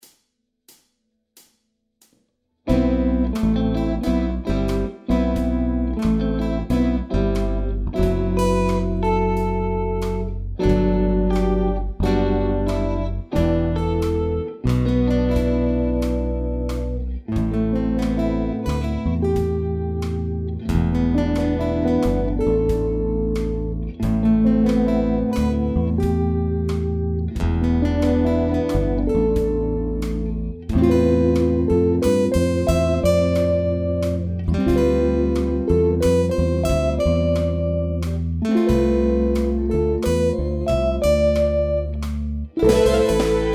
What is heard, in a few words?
sad, instrumentals